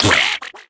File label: SFX Bad Hit